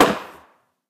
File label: Camp Airbag Break